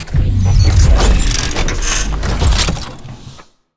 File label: world machine show planet; ancient relics